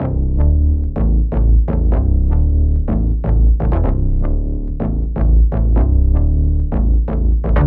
Bass Am 125Bpm, Techno; Bass Loops; Helpdesk; Ghosthack Advent Calendar - Day 6 - Techno Kit

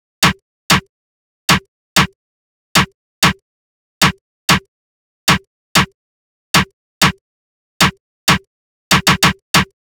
Drum Loop 95 BPM Snare, Hip Hop; Cymatics; Perro Drum Loop

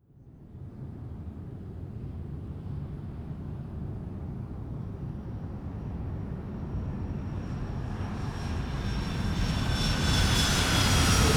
Jumbo Jet Landing; Vehicles; Aircraft

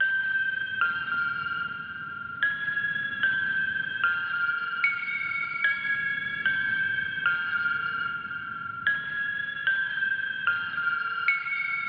149 BPM F Min Bell, loop stems; Cymatics; 2022 Melody Collection Platinum Expansion - Pt 2; Pearl

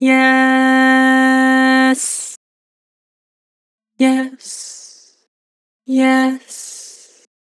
Yes, Vocals; Harmor

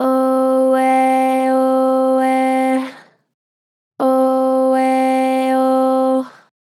Str Flat Ohh Eh Ohh, Vocals, Pitch Flat Strong

